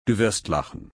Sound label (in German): lachen